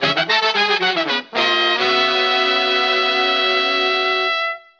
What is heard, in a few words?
horns, phonky loops